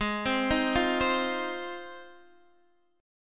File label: Ab 6th Ab, Guitar, DADGAD